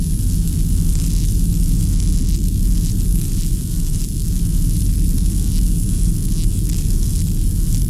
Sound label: point idle, anomaly; Dynamic Anomalies Overhaul; eugenium anomaly